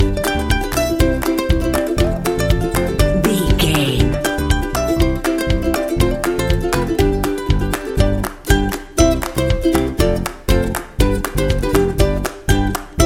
super happy fun bumper

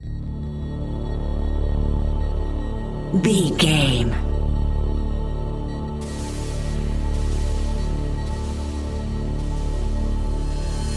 deep intelligent electronic game music

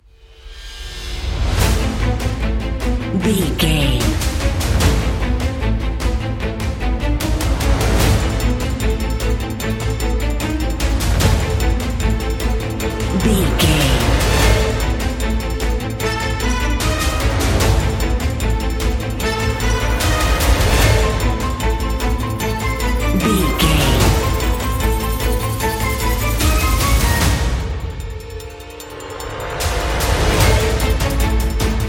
action chase trailer intro

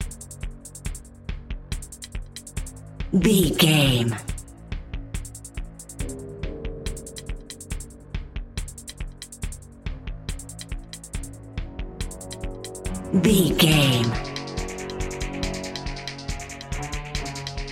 rpg pack boss

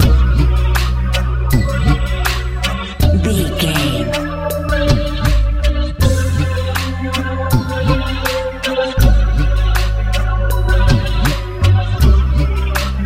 hip hop pop

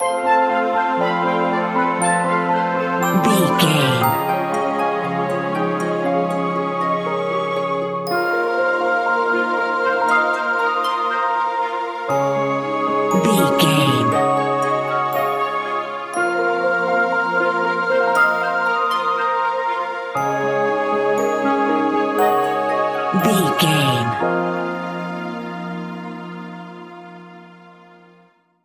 enchanted mystical forest

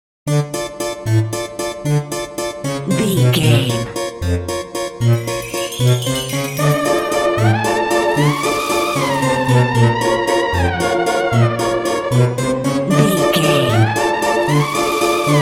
happy halloween spooky comedy fun

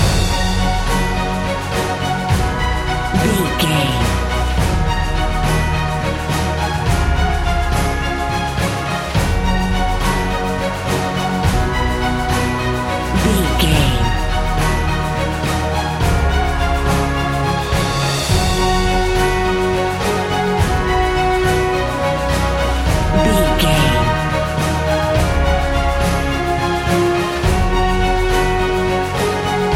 cinematic corporate loop

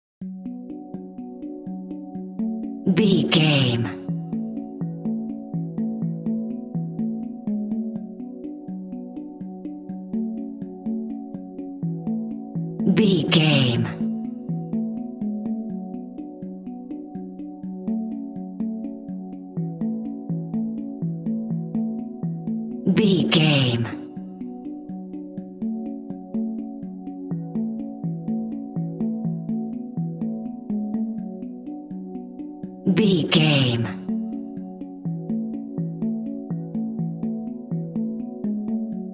ambient hang drum

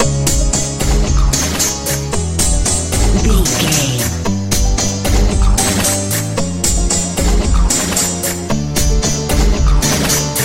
dance pop

